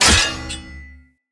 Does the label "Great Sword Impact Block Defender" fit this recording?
Yes